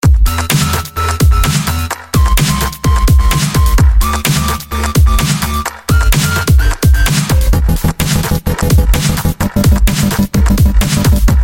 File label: Alarm Dubstep